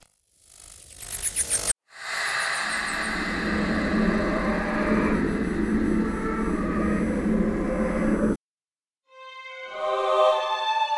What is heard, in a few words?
bgm